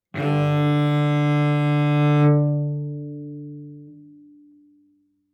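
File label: Cello arco fortissimo sul D D4; MIS Pitches - 2014; Strings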